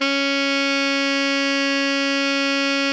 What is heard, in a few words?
alto FF C#3, saxophones; Greytsounds; Philip Wolfe David Crozier's Saxophones